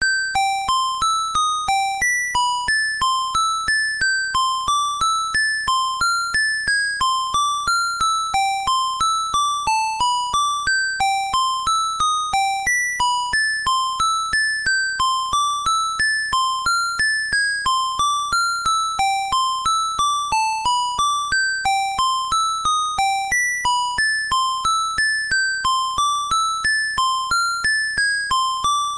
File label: Menu Theme; LOZ